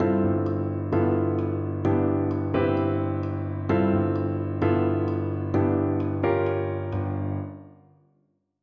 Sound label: pedal tones